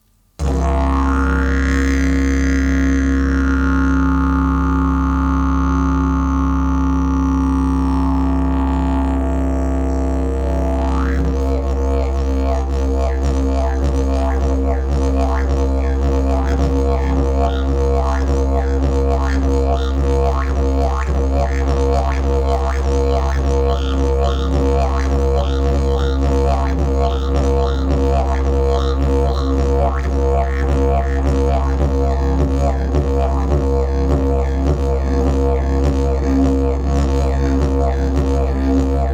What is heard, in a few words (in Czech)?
jasan, didgeridoo